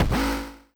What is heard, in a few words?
robot footstep long